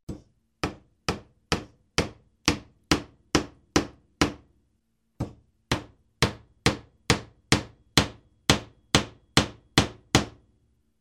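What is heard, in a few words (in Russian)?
забивают гвозди в деревянную стену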